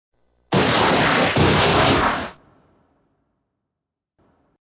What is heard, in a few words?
A loud crashing sound effect.; Crashes